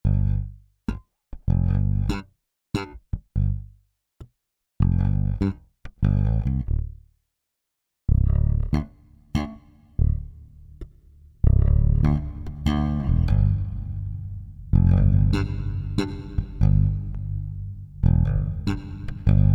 Bass Huge Hall; Yardstick

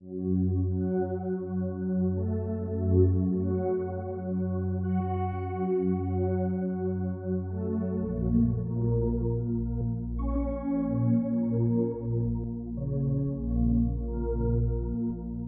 Razor; Organa

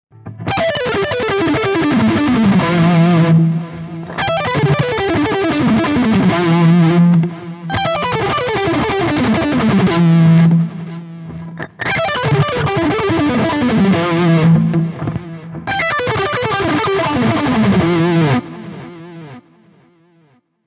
lead shred all positions

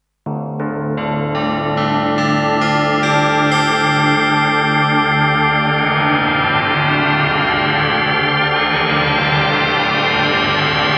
DX7IIFD Tubular Bell Wah

